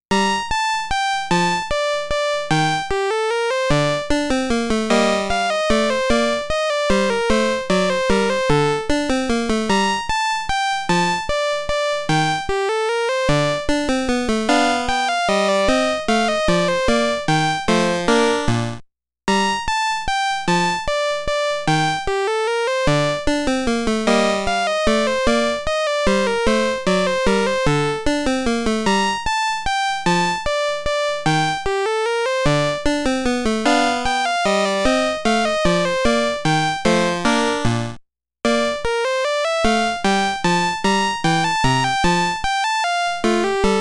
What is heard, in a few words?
Listen to my piano- Minuet in D minor